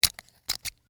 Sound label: Foley, Sun, Glasses, Open; Dressing Sound Effects; Dressing (Sound Effects:Human); BlastWave